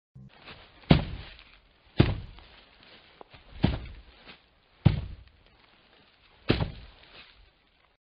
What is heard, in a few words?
footsteps; fnaf 1